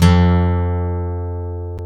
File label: Gtr Acc; CMI